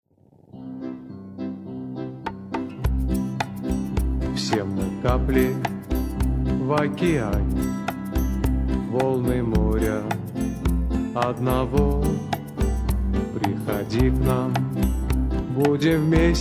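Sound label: Song, Russian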